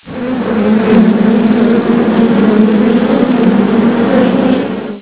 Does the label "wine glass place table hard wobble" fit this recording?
No